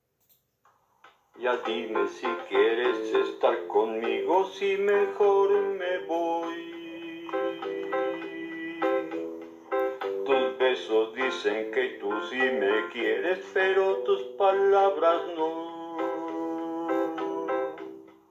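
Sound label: Spanish Ringtone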